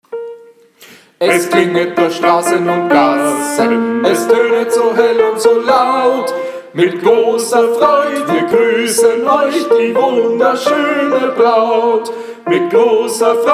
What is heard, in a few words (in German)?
TENOR; Gesang; Willkommensgruss